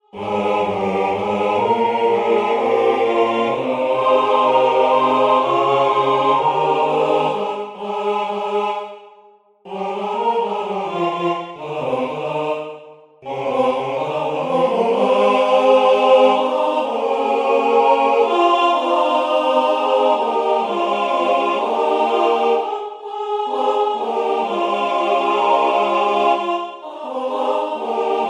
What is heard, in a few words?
MIDI SSATB